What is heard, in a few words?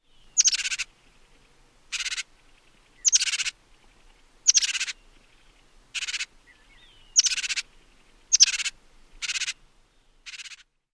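Juniper Titmouse calls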